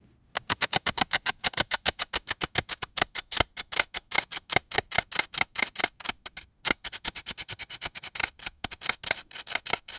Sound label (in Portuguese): som medieval de crafting